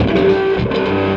Chooka Chu, Electric Guitar; Sonic Foundry (Sony Creative Software) - Friendly Fire

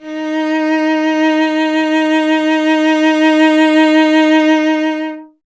STR cello D#, Cello; Miroslav Vitous; Solo Instruments VOL-1

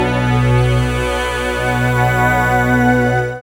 SYN, DANCE SYNTH

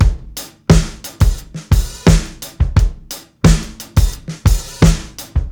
87 STRONG BACK, drums; Basement drums vol 2

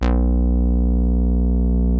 TAURUS C3, synthesizer

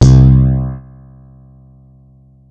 Slap, Synths BASS

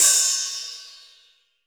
RESO HHO, Hi-Hat

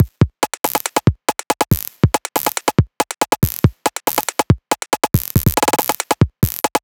140, Drum Loops; GlitchJuggler; Electronisounds

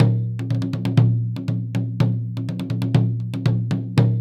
PERCS 120BPM; INIS - Opium

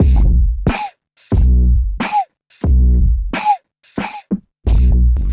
Gated Beat (90BPM); musicradar

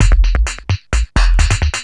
Beats, Industrial

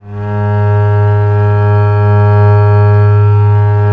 VL G#1, VIOLE, Historical Instruments; USB Soundscan vol.23

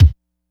Kick, Trance

